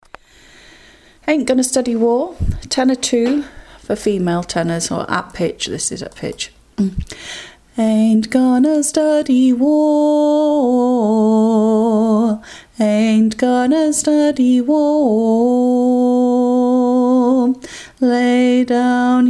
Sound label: tenor female; Aint Gonna Study War